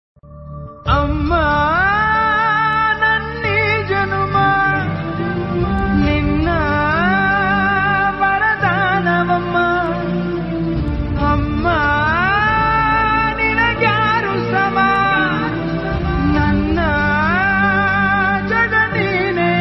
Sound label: tamil song